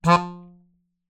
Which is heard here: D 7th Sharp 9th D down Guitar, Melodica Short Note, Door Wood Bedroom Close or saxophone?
Melodica Short Note